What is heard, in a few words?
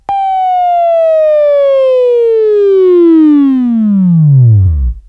Buzz